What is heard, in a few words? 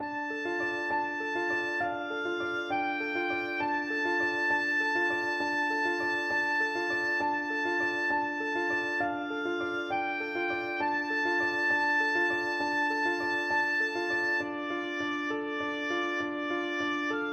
piano of desire, oboe